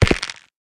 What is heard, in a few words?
step, stem; Minecraft